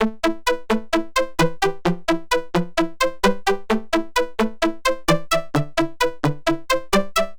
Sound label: pluck bored pluck; fabrik core